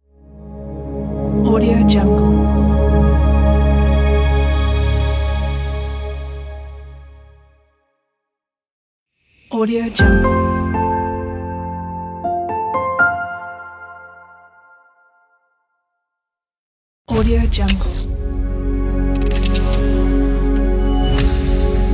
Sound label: marketing logo pack